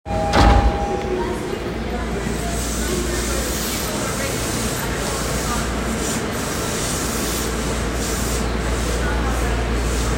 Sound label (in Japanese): 都営大江戸線西新宿五丁目西, train sounds